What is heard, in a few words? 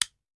Hand Gun Foley; Sound Ideas; Guns